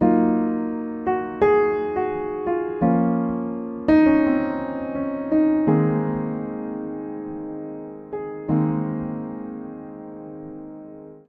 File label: Phrase iv i VII Bb Minor 85 bpm, Upright Piano; Compromise; Upright Piano by Spitfire Audio; Ableton; Factory Packs